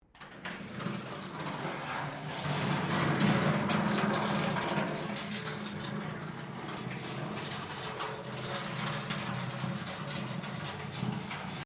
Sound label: metal rubbish bin drag concrete; Materials